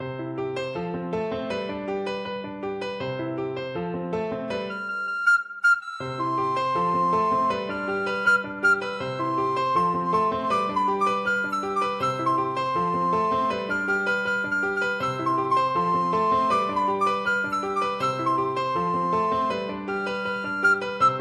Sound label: recorder